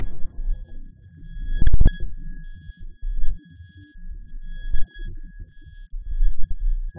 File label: loud